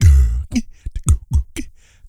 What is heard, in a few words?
DEEP BREATH, Vocal; Spectrasonics